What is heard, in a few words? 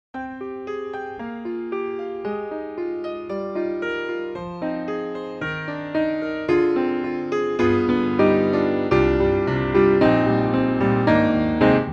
Nocturne; Soniccouture; The Hammersmith Professional Edition